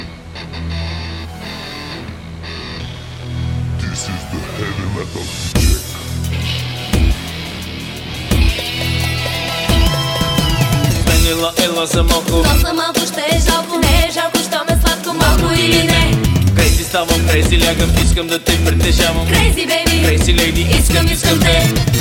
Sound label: Heavy Metal